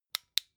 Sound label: flashlight on